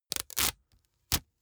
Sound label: package tape dispense hard